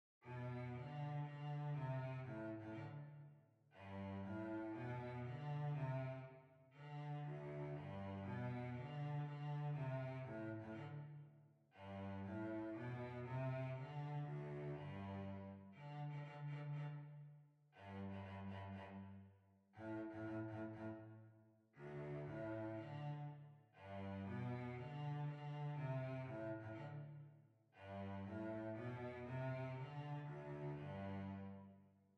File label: Violoncello